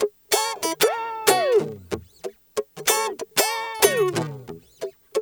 GUITAR, Funky Ass Loops; East West

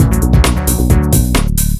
2 Step 133 MIX, House; USB Soundscan vol.51; House Side Of 2 Step; AKAI

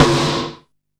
Snare, Drum & Bass

